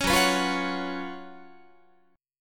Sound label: F sharp Major 7th Suspended 4th, Guitar